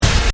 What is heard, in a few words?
Hit Stab Synth, Production, Element, Hit, Stab, Synth; Sound Design